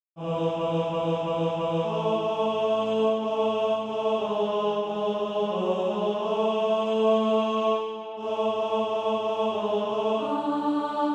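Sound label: tenor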